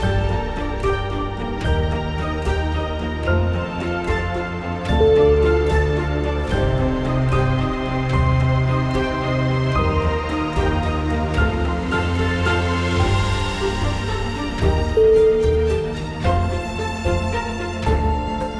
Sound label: uplifting blooming cinematic